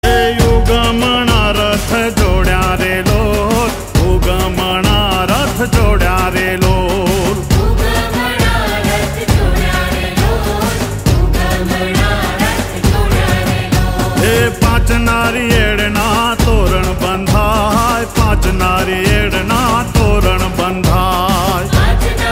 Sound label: Gujarati